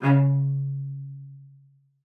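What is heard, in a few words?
CELLO spiccato C#3; Xsample VOL-5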